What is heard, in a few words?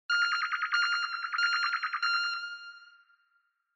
Retro Anime System Mid